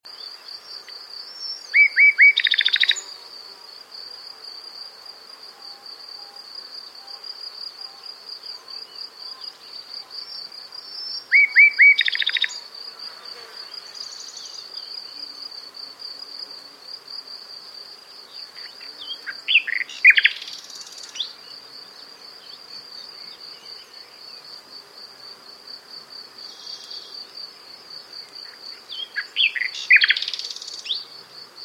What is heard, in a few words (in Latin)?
Luscinia megarhynchos; SETE